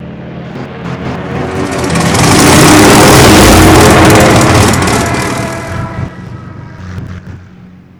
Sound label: Stuka damage, vehicle; mohaa